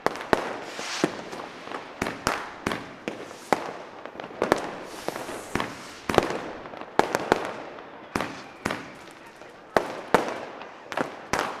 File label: fireworks; tower-fireworks; game